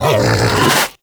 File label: Snow Critter Vocal Alerted; Hunting Critters